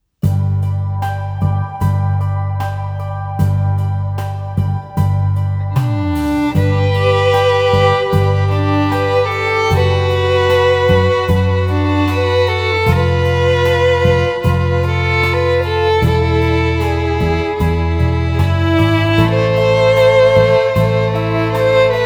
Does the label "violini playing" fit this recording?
Yes